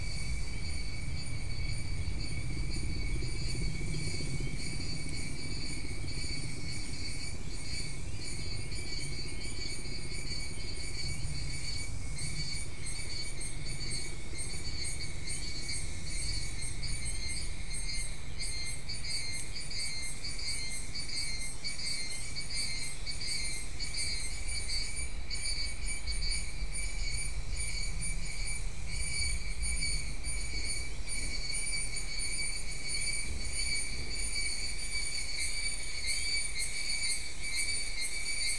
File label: mainly insect cluster